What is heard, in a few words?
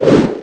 swing twice